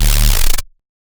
D Bass One Shot

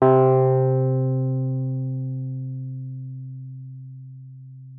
WUF C3, WURLITZER; Xsample VOL-1

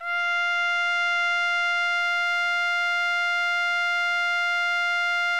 TRUMPET, SECTION LOOP; Best Service; Killer Horns

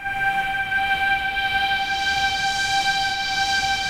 SYN WHISPRS; Pro-Rec - Synthsonic; Z1 PADS

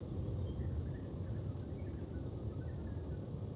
Ambience Loop, outdoor

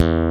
E1, GUITAR; AKAI S-Series CD-ROM Sound Library VOL-8

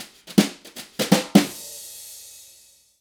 Live Shuffle Fill 152, Lounge Drumz

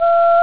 Female Chorus; ST-XX Amiga Soundtracker Sample Packs

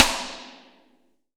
DWRIMH1RF, drums; Best Service; Real Mega Drums VOL-1

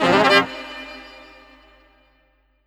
SAX, 150 BPM; Ueberschall; Drum & Bass Resonance

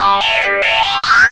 GIT LP, Drum & Bass, 145 BPM; Ueberschall; Drum & Bass Flash Trackz

